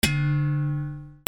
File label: GARAGE